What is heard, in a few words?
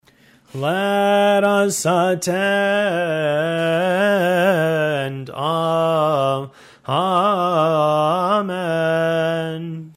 let us attend amen, hymns